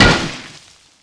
vessel

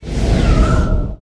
mob die, Monster; Bossmob; 금전방; 금적산

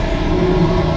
skill shot charge; SkillSound

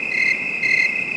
night bug; EnvSound

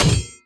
DROP METAL

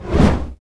skill fire win; SkillSound

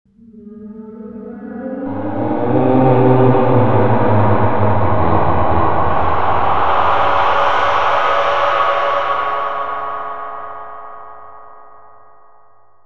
Dungeon Shout; EnvSound

